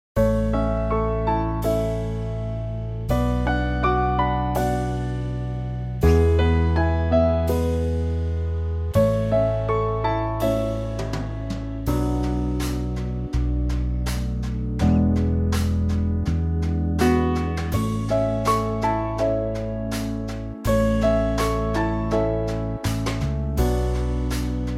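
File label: A, Backing Tracks for Male Vocals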